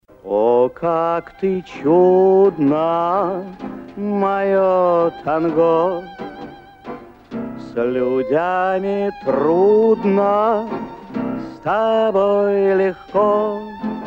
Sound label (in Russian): tango bayan